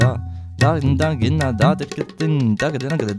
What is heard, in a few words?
INDIA R, IND ENS PH, Heart of Asia